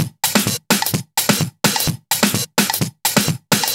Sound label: Percussive Loops 128BPM; Vengeance Samples